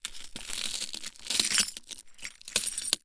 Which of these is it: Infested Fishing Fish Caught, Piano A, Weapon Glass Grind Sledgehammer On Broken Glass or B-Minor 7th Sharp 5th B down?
Weapon Glass Grind Sledgehammer On Broken Glass